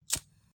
lighter; Lighters

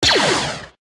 blaster imperial, Guns Fire; Weapons

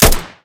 tec9, Guns Fire; Weapons

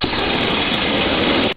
extinguish

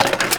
screwdriver close; Civ Vehicles Misc; civ13; civ14

